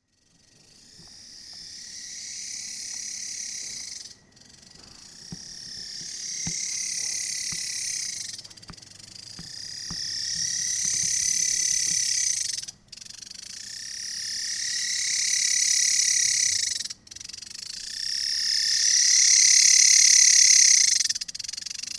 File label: Laos Insects Noise